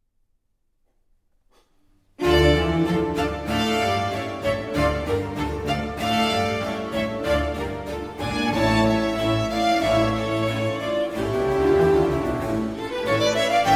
Concerto For Viola d’Amore, Strings & Continuo in D Minor, Allegro